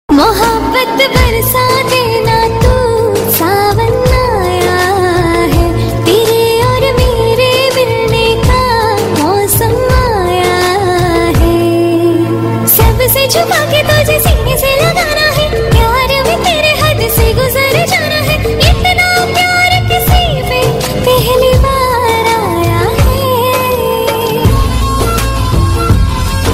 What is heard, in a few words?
female romantic; ringtone